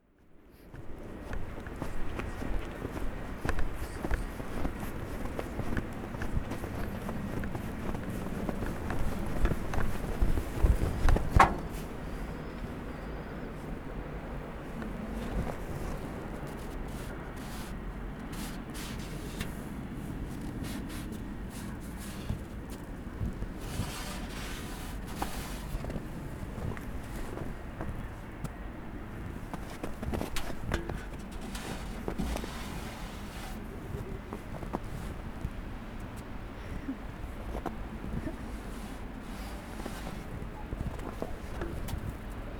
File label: snowplay